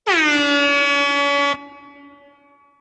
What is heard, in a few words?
horn